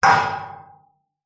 impact metal fence hit strike hard object resonate